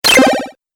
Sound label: Arcade 8Bit Robot Fx, video game